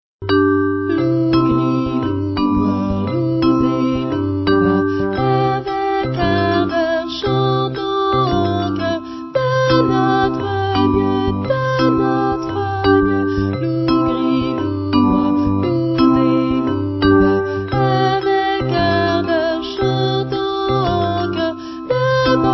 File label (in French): canon; loups gris loups noirs; louveteaux